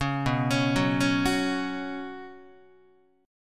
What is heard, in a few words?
B Suspended 2nd B, Guitar; Bsus2 arpeggiated; 4,7,4,4,5,5; 4A,4E,5A,5D,5G#,6C#; JGuitar